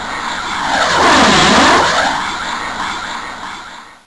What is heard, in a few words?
FLASHBY; ENVIRON